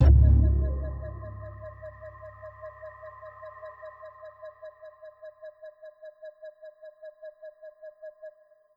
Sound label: boom noise